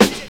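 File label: SD, snare drum; X-Static Goldmine 1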